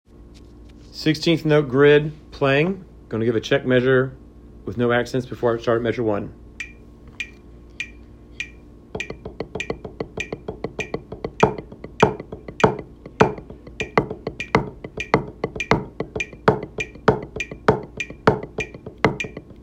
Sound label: 16th accent grid playing